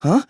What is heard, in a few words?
huh; taichi